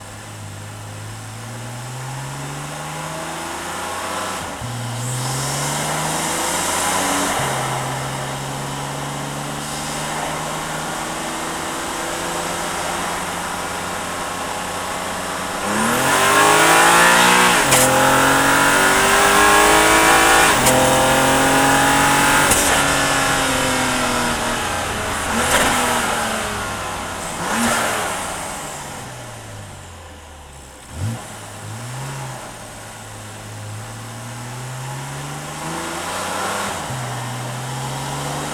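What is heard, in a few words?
BMW M4 Onboard Drive Gearshifts Engine Center; 2014; DPA4061; Pole Position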